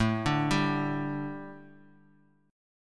A 7th Suspended 4th A, Bass Standard; arpeggiated

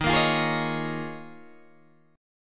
D sharp 9th Suspended 4th D sharp, Guitar Open G

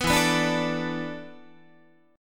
E 7th Suspended 4th, Guitar; E7sus4; strummed

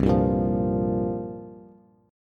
C 7th Suspended 4th, Bass; C7sus4 strummed

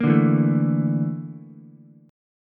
A sharp Major 7th Flat 5th A sharp Ukulele; A#M7b5; strummed; JGuitar

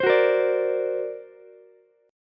D Augmented 7th Ukulele, chord; D+7 strummed; JGuitar

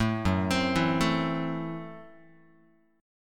F sharp Minor 7th Flat 5th F sharp Guitar

